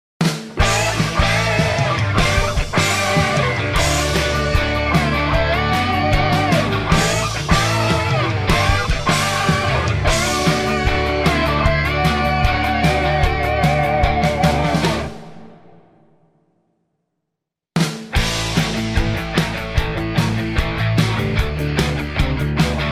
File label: (D) No Backing Vocals